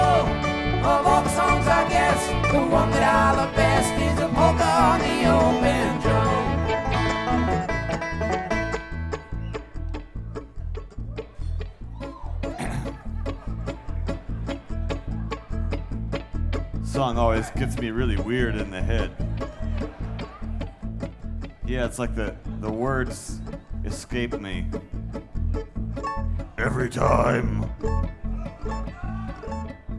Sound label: Polka On A Banjo